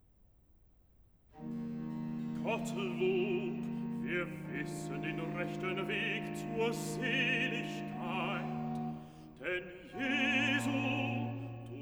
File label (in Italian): Cantata, Recitativo (Bass); BWV 79 'Gott der Herr ist Sonn und Schild'; IV. Recitativo (Bass) 'Gottlob, wir wissen'; Bach; Wittenberg; Feast of the Reformation